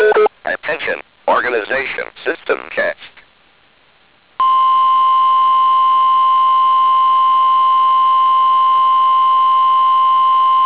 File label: NOAA Weekly Test